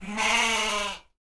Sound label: goat cry